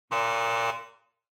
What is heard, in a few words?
Electromagnetic Buzzer Room